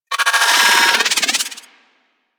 Drone Vocal Player Spotted; Warframe; Venus; Venus Terra Attack Drone

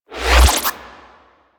Cast Start; Warframe; Bard

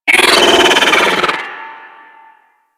Pet Vocal Grenade; Enemies; Vocals; Warframe; Alad V Pet

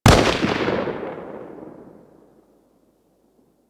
Weapon Enemy Tail Outdoor; Warframe; Weapons

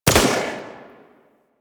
Pistol Prime Shot A; Bard

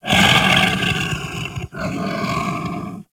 Kubrow Feral Vocal Breath Walk; Warframe

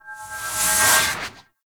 Melee Heavy Attack Large Charge; Warframe; Weapons; Whooshes; Heavy Attacks

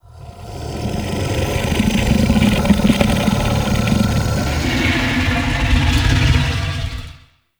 Inhale; Enemies; Sleep; Warframe; Infested; Pit Monster

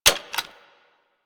SMG Alt Mech; Weapons; Warframe; Tenno; Sundial

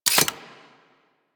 Uzi Pistol Mech, weapons; Grn Uzi Pistol

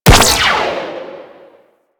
Flak Ship Rifle Fire 3D C; Crp Flak Ship Rifle

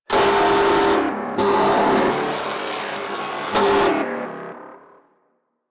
Energy Scream; Ambience; Warframe; Corpus Railjack

